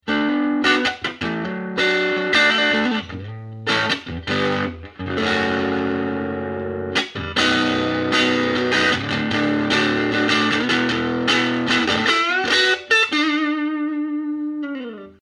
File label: overdriven